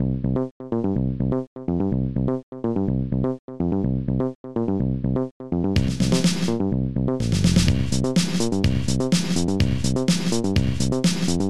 clean bas; The Module Collection 1

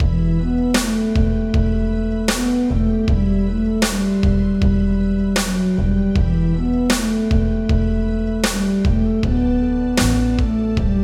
dungeon; mordor

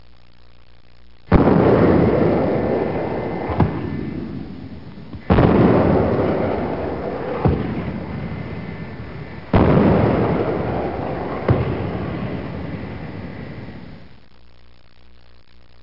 blasts

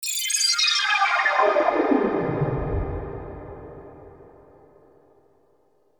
AMBNT 160; eJay; Ambient

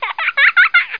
giggle

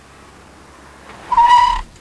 squeel, cars; transpo

